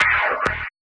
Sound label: Zappy Bumpers, Fx; Sonic Foundry (Sony Creative Software); Esoterik Beatz